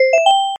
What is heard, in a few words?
round end